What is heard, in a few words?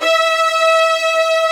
VIOLAS B3; AKAI S-Series CD-ROM Sound Library VOL-2